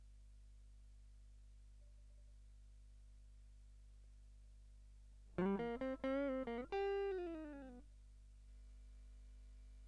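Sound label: Guitar Line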